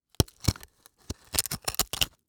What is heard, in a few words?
Horror Scary Bone Scrape